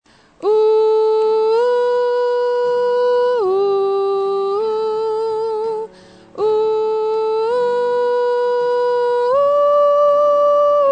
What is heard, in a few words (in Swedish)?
bas